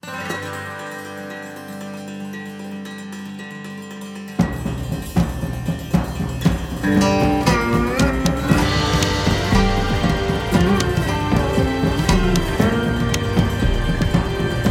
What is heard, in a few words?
music